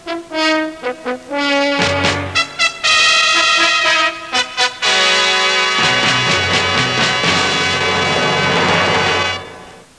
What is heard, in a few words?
fanfare